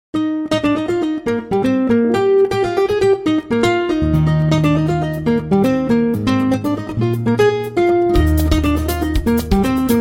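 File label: original ringtones and notifications Nokia 808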